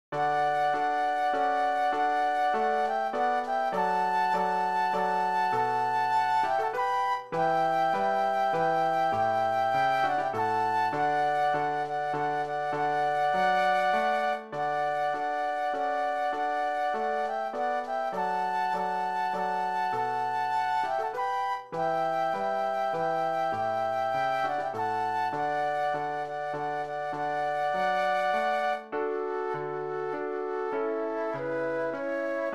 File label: Flute Duet and Piano; classical